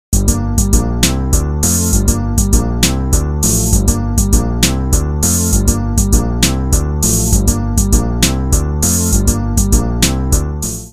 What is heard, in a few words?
jazz